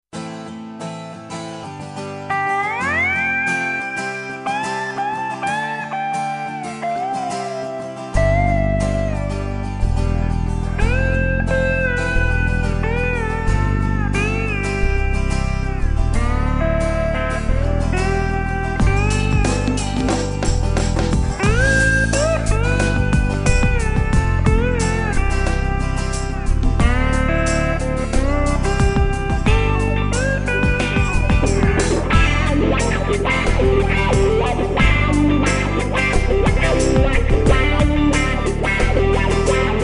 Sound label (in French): Bip ballade pop; guitare